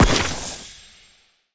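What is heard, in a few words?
black cannon, attack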